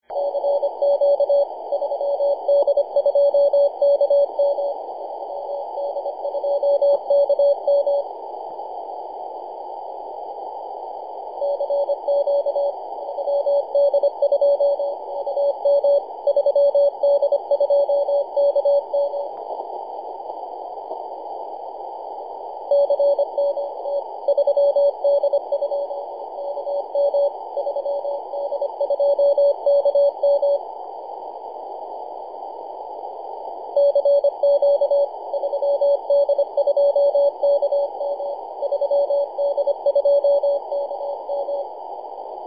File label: 14.023 CW